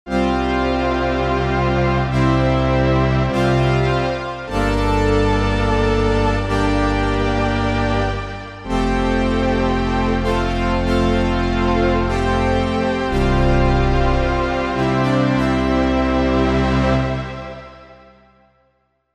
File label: Saw & Pulstr; Korg MSC-1S Variety